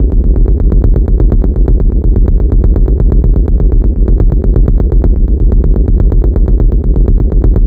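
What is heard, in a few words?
Bass, 128, F minor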